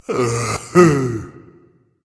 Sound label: content berserker; cuchulainn